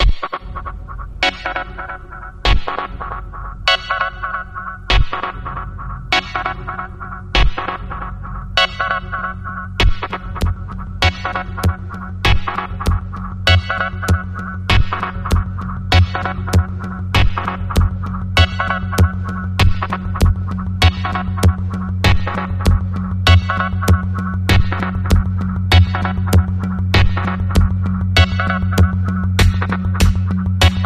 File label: Cessation Suspense